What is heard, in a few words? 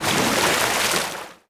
veh zodiac splash big rear; Call of Duty Modern Warfare